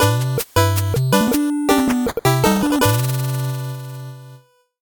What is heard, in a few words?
8bit jingle slow